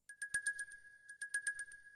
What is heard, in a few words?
load